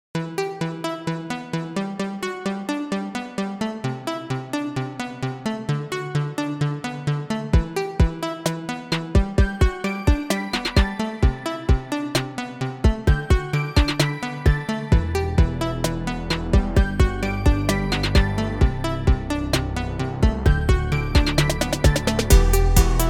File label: Trap; Trap Supreme Kit